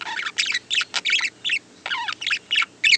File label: Warble